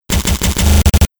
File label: Big Nailer, Breakers & Transitioners; Best Of N2O Library